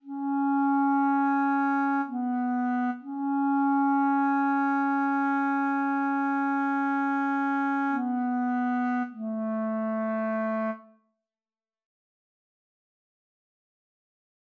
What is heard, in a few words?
Clarinet